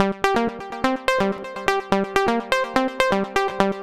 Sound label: Warehouse Lead G 125, Synth Loops, 125bpm; Zero-G; Soundclash; Nu Dance Electro